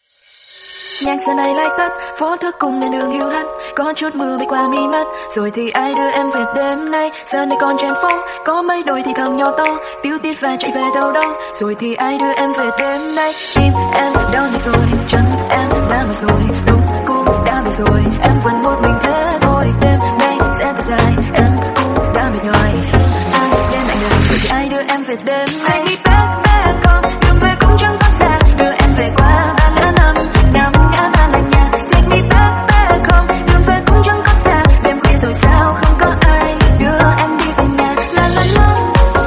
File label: low cortisol speed up